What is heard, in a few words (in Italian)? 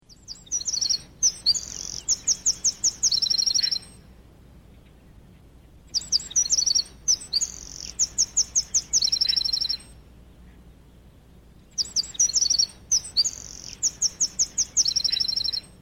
scricciolo; faunistico